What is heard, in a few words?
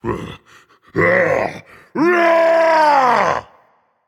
fear, dragon; fafnir